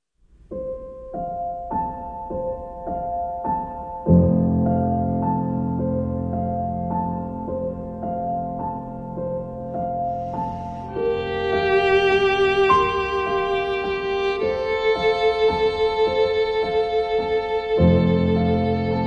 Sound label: piano; Akira Eguchi